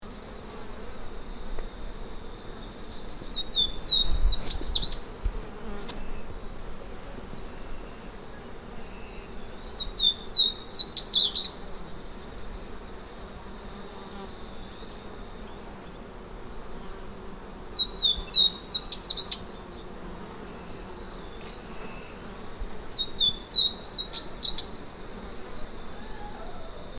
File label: White bellied Redstart Wawu Sichuan; China 2011